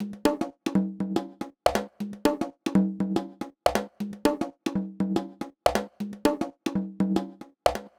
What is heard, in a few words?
Conga Loop 120; Equipped Music; Loungin House; Perc Loops 120